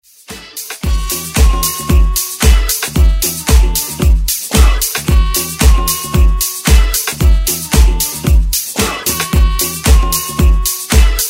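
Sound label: 70s Extended Redrum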